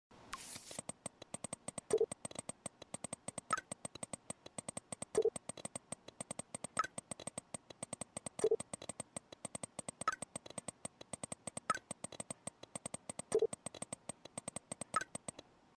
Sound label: sms writing; life is strange